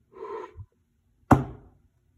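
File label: blew on the stamp and stamped it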